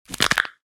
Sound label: Snap A Neck